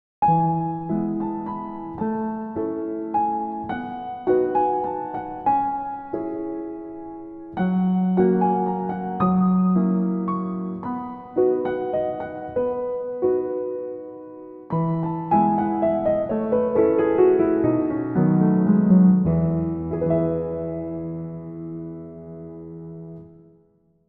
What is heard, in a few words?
Drifting to Sleep